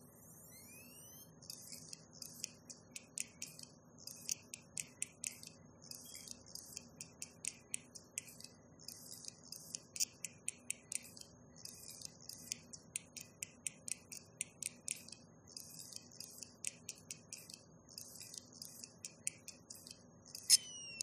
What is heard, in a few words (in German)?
Samsung Festplatte klackert oder klickt